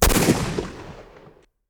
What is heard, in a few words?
colt exterior shot